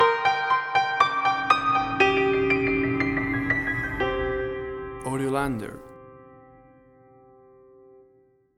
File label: Action Trailer Sounds Stinger